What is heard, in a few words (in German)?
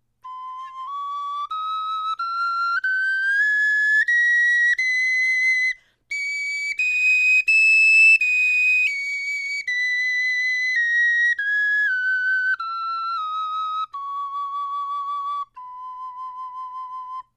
tonleiter, knochenfloeten